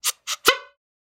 Bycicle Horn; SoundsCrate

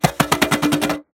train starting; soundscrate

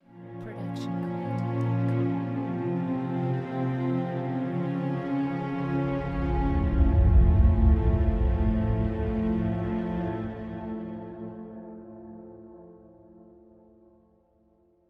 Mystery Piano; soundscrate

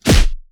Anime Super Whoosh Punch

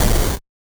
8 Bit Explosion; SoundsCrate

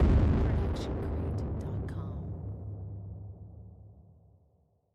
fuzzy explosion; soundscrate

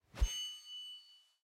Sword Swing; soundscrate

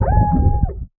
underwater scream male bright; soundscrate

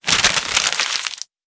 paper

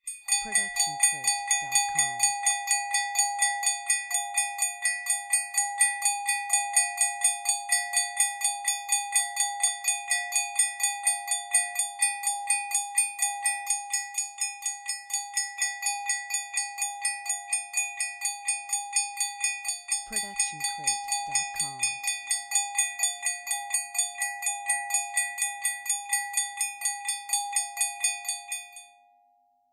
bell clock

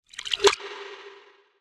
water swell; soundscrate

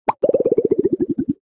Stylized Bubble Pops Descending; SoundsCrate

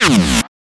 stylized glitch sound; soundscrate

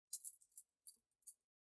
Chain Foley; SoundsCrate

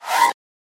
horror ghost scream; soundscrate

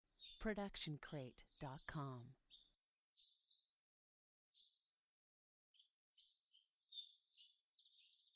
Multiple Birds Chirping; SoundsCrate

